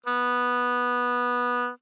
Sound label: Oboe ff B3